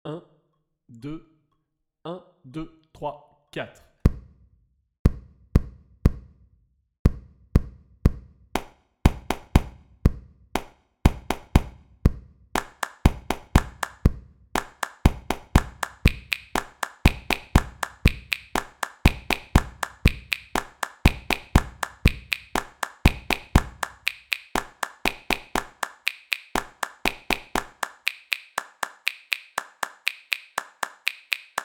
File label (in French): rythme 4 voix